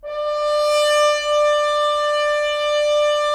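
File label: Solo French Horn, brass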